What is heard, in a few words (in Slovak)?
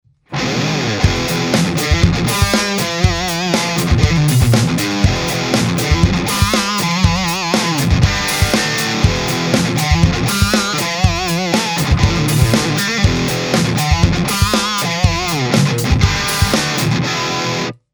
mikrofon c.3 poloha c.3, Test mikrofonov na git. aparat